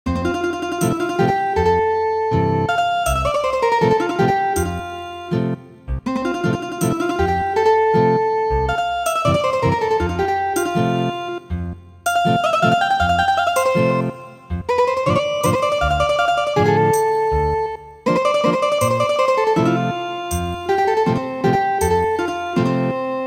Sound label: Ethnic Guitar, midi traditional